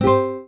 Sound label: PUZZLE Success Guitar Fast Three Note Climb Dry; stereo; Universal Sound FX